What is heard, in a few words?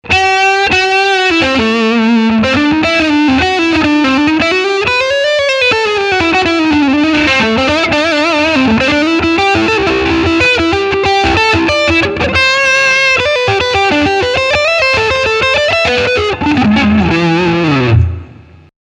leadline distortion